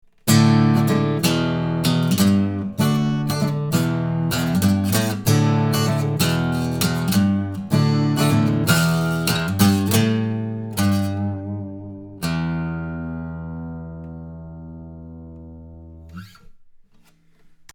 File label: TF11 Git